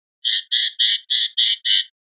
jases, pardillo

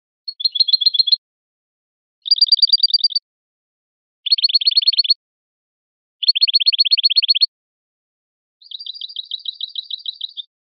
rep campanilleo

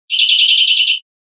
chorros cortos campaneados, verderon

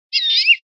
quejas mio, verderon

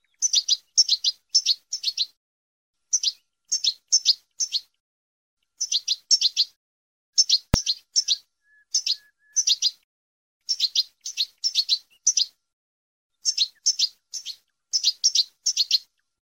padreos sueltos; campero

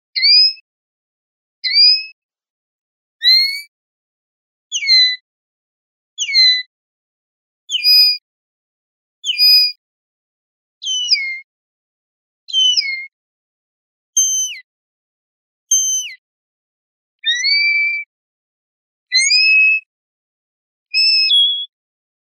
quejas, pardillo

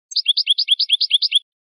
floreo tobi tobi; campero

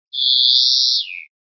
rascada, verderon

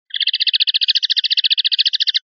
rep torreos, pardillo

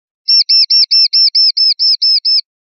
titeos

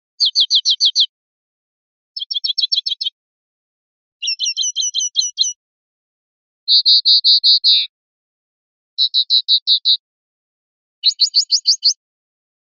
yi yi, pardillo

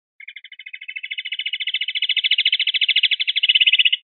rep acanariado, pardillo

